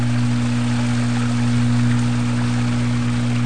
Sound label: hot tub